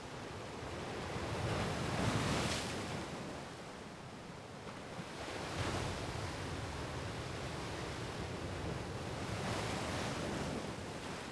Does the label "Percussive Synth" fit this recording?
No